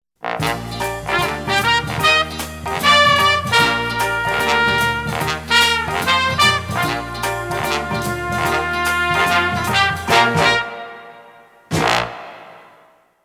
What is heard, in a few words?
Horns And Wixie; Pams; Wixie All Over Again